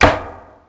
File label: Door Wood Small Closed; Max Payne